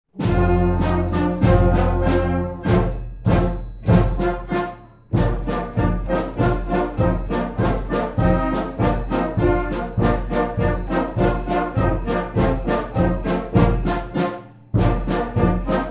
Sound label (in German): Polka